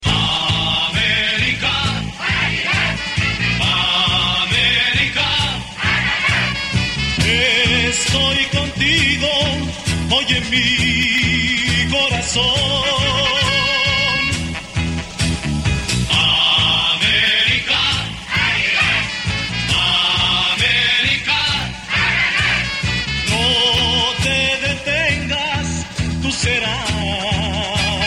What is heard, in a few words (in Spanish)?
himno americanista